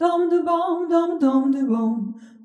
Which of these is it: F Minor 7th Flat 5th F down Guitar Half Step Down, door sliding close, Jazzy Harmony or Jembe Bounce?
Jazzy Harmony